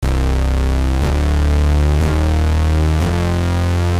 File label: bass filth; sm-271 calculator synth